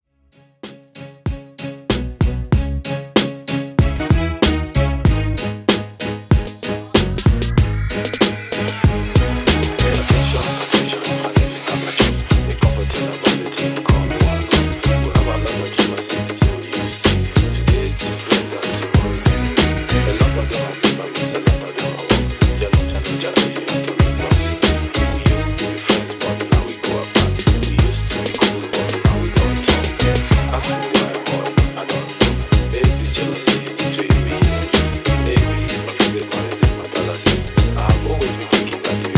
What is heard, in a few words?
Mashup 95 Bpm; djyan